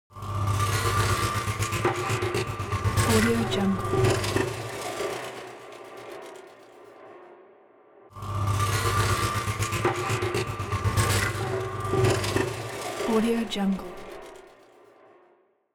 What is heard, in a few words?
abstract soundscape scifi flutter